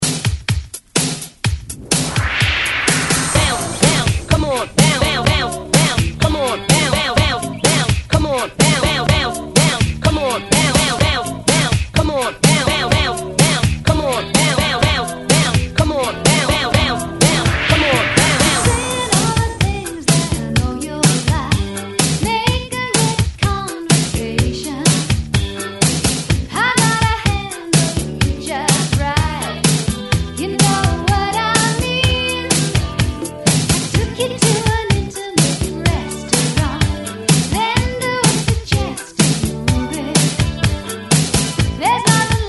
Hype ReDrum

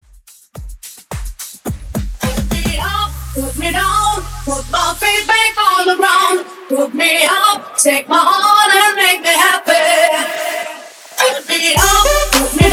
acap in out hype club party 107 bpm

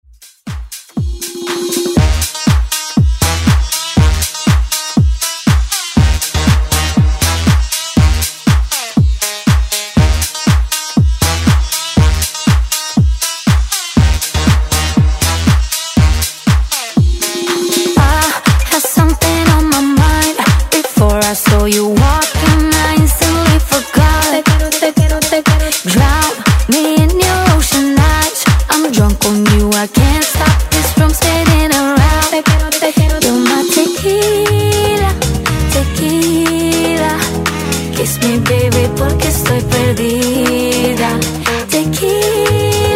Dance

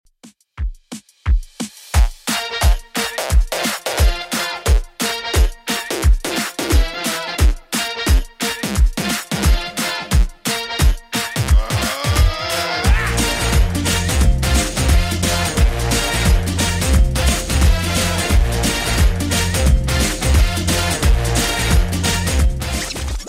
90s Redrum 176bpm

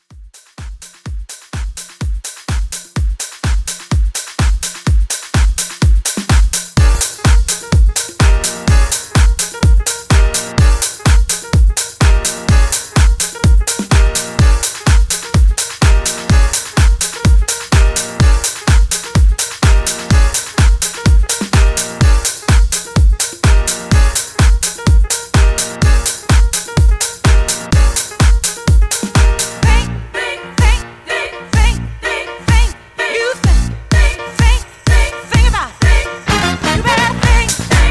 60s House Re Drum

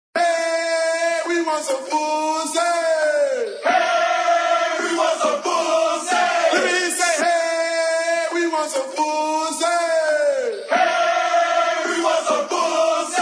Acap In

